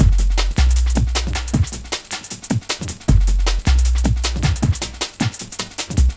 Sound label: drum and bass